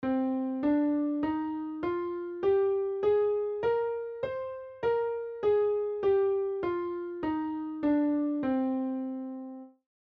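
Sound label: c natural minor scale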